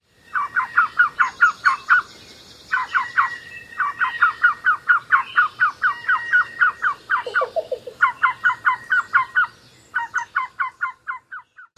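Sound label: Black-billed Cuckoo whimpering calls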